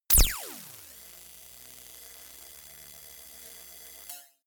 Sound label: laser welder long; soundpool